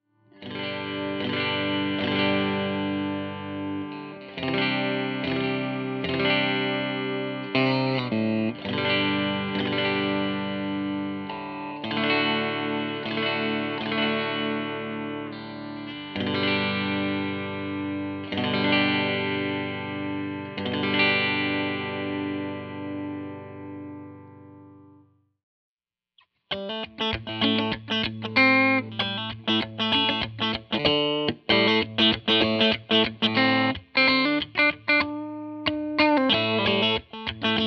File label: Turk Bridge Clean, pickups; Revel